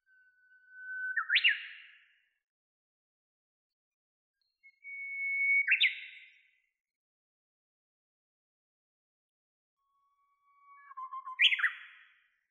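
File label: Japanese bush warbler song; uguisu saezuri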